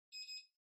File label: Ceiling Light Far Beep